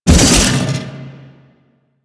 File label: Jump; Warframe; DE; Sentients; Erra; Foley